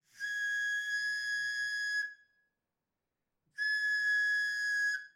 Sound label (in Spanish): AFILADOR DE CUCHILLOS SILBATO